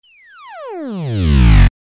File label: move down interferenced